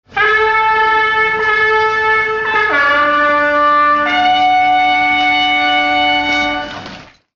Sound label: Imperial Fanfare